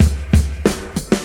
Boombap drum loop

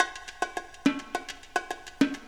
bingo bango bongo, Drum loops

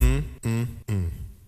mmm hmm mmm; ooh ahh

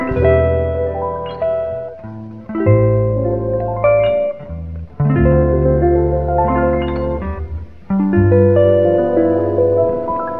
guitar

